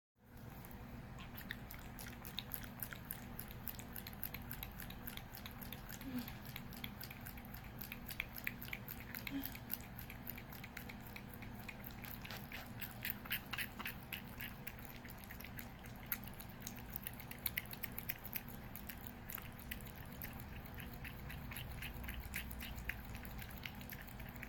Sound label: Squish